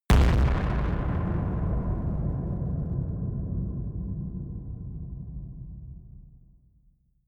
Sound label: distorted explosions stereo